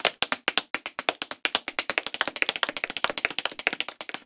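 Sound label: tap dance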